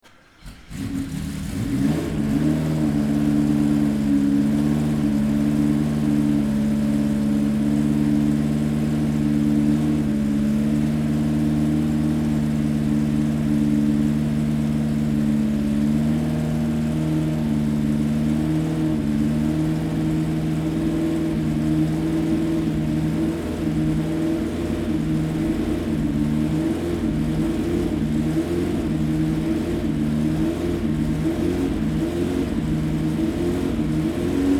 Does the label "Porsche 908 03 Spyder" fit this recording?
Yes